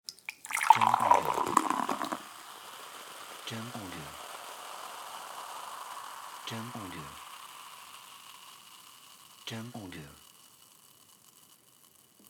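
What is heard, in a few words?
Pouring Coca Cola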